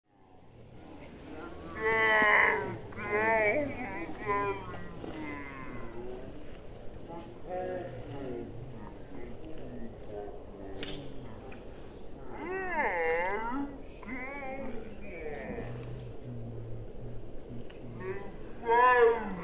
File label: Children Picnicking Medium Longshot; Small Groups Playing Some Adult Voices; Tom Hutchings